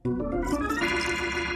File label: organ